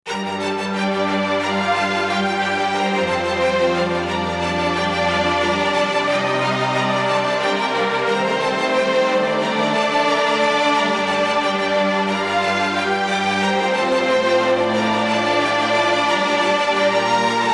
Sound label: string buss soloed